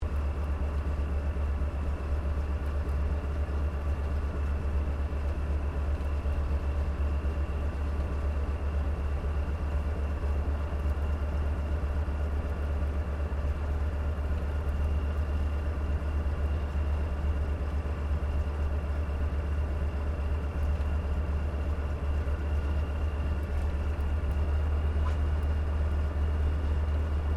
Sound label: AIRCRAFT CAC CA-25 WINJEEL IDLE INTERIOR, fighter trainer; RAAF Winjeel; Airplanes (Sound Effects:Vehicles)